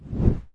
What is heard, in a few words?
zoom